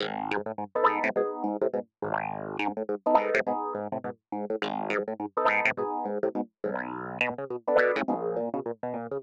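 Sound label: 104 Pop Clavinet Ab minor; Pop Rock; Piano; E-PianoClav; Pro Tools Essential Loops; Digidesign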